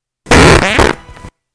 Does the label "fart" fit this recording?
Yes